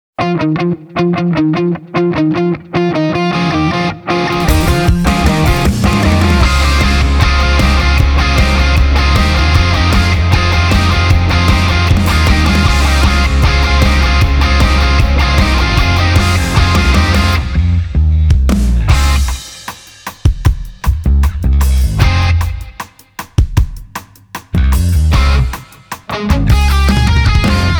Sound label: Inst; bang dream